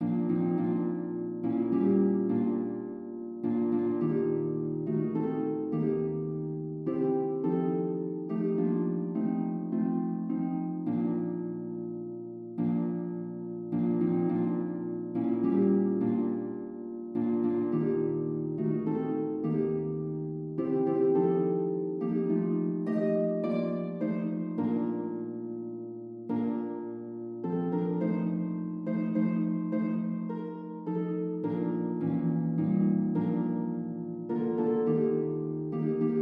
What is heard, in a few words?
the old rugged cross harp